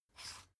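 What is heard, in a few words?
toothpaste close twist, Bathroom; haus-garten; badezimmer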